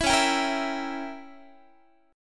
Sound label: C sharp Diminished 7th C sharp down Guitar 4A 4E 5A 5D 5G sharp 6C sharp; C#dim7; strummed